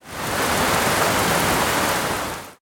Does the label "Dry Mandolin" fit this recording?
No